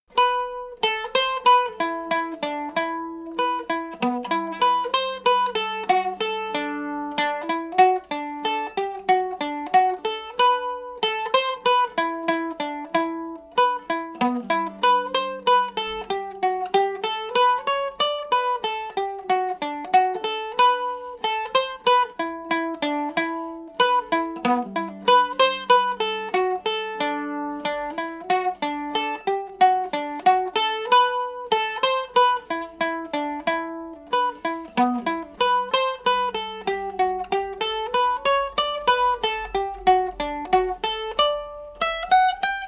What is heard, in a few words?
slow, banjo